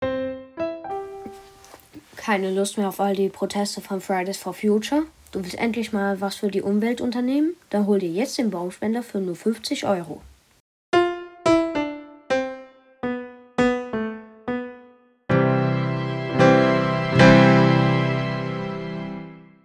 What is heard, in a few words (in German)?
Musik jingle; musique et public